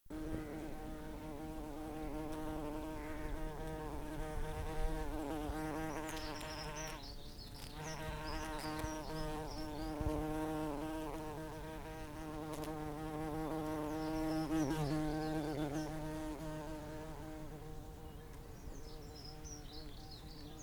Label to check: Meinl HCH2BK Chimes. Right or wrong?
Wrong